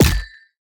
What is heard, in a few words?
night monster